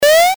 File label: jump